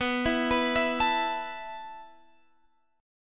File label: B 7th Suspended 4th B down Guitar Standard; arpeggiated; capo=9